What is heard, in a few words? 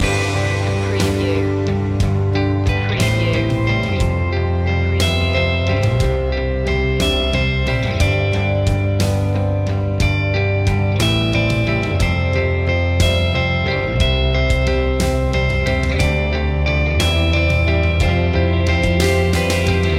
over and over loop